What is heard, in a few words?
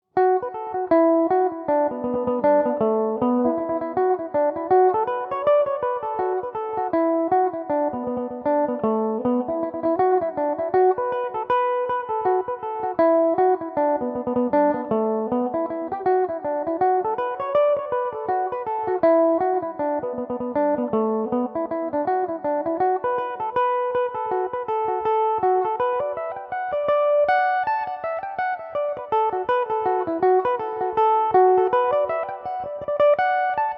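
B minor, irish mandolin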